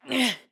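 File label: Lift, voice; BOTW; Breath of the Wild; Link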